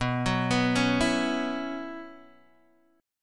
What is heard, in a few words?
B Suspended 2nd Suspended 4th B Guitar Open D, chord